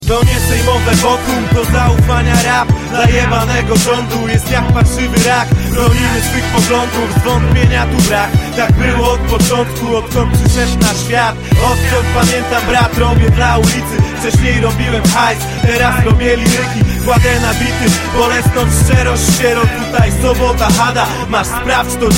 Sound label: rap, hip hop ringtone